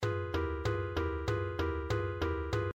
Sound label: low health